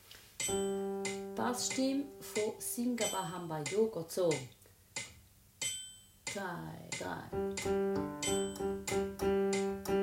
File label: Bass